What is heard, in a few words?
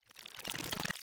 Glitch-Effect; Boss-Fight